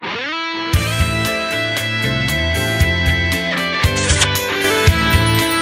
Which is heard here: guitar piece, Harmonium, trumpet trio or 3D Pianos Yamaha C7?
guitar piece